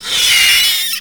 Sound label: Cable Rat Death